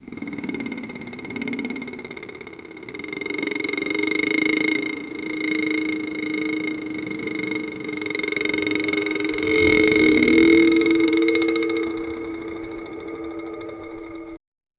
slow rotation of a large, gear

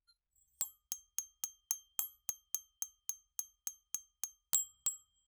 death

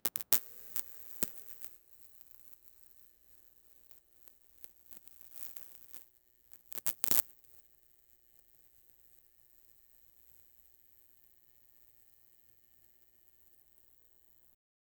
pulsed discharge of elect, arc

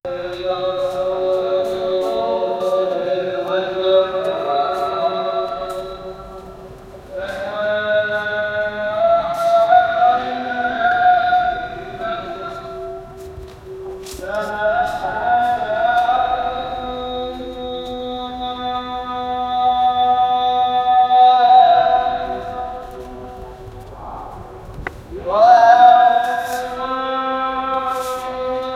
reading azan in mosque

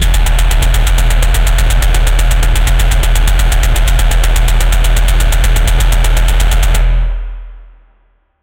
aggressive synth; bugatti

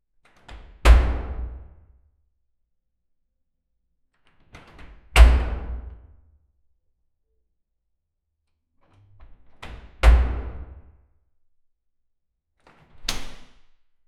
a person entered through, shut

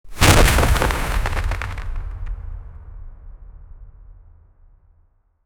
ice themed attack sound; reverberating

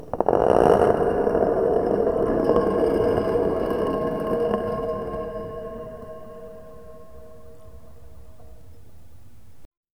large round stone slowly, cathedral

